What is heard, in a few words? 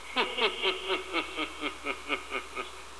laugh; jadeite